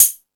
More Cymbals, E-MU Pro-Cussion

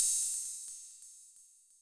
Ride Delay, Old Skool House, 132bpm; Big Fish Audio; Marshmellow; Construction Kits

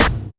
Percussions

